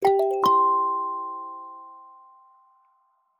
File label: Notification